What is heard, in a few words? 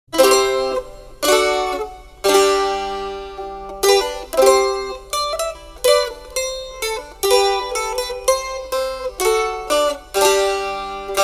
cittern sample